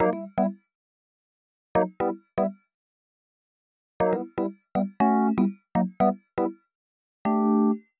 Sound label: 120 Rhodes Riff Chords A, Keys, Nu Jazz House; PowerFX